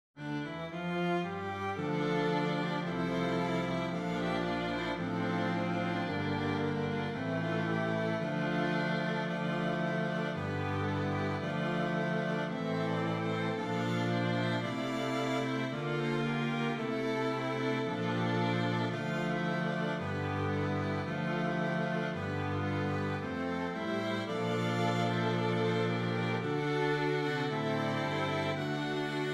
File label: Strings; sanctus s129